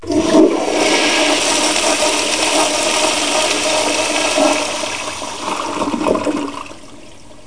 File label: toilet